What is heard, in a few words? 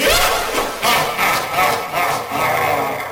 laugh; sonic.exe